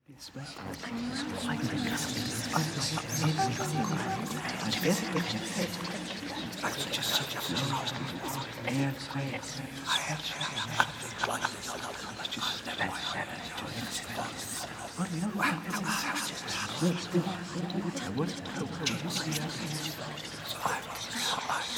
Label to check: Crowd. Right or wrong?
Right